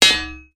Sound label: impact metal heavy thump clunk